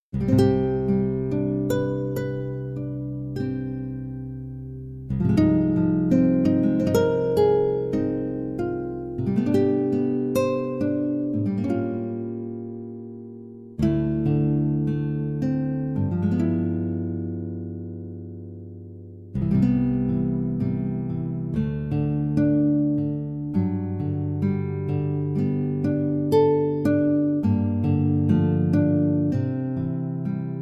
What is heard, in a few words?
gtr; C acoustic guitar; key - C - vocal range - C to F; Beautiful acoustic guitar version of this wonderful song from "The Sound Of Music"